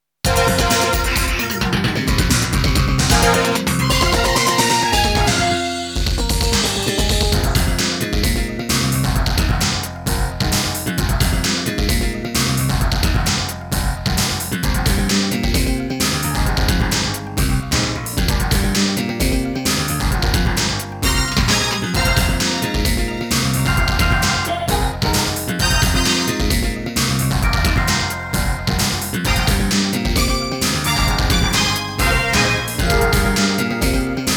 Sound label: All Round Clear Music